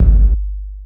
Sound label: GATED K8, GATE COLLECT; SPECL FX